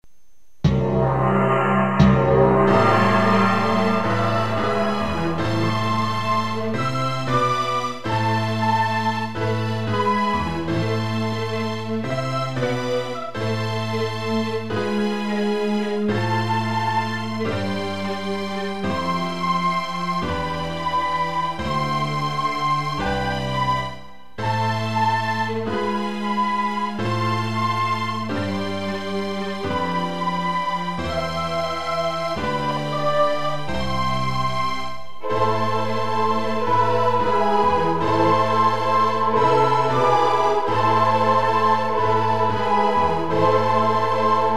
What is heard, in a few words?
chorale in A-maj